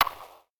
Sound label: breakbeat